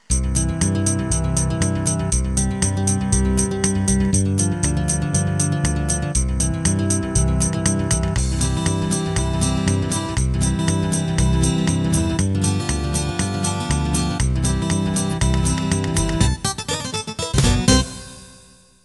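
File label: Country 2 Step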